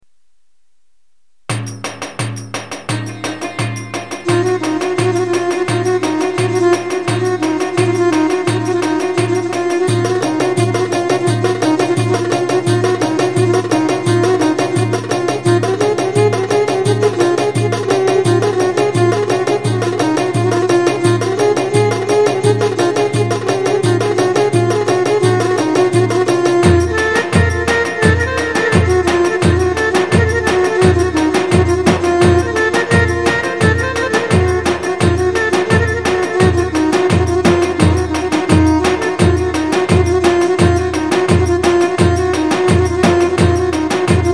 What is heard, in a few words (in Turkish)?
mengen kocek, sx3000 ritim